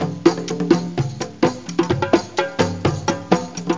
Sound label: breakbeat; World of Sound; instruments; beats